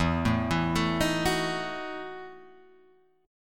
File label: E Major 7th Suspended 2nd Suspended 4th, Guitar, Open G